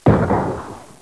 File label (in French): Bruit sou; MOBICLIC 14; europe